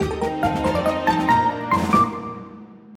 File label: Home Menu Banner